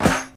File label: classic smooth bottom end hip hop snare sample f key